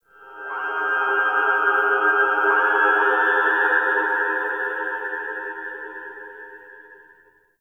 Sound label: Exterior Tonal Textures, Ambience; Warframe; Railjack